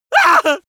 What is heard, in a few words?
male painful shout short; vocals; comedy-cartoon; soundpool